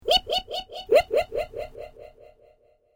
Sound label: Created Creature Small Ping, Alien Sound Effects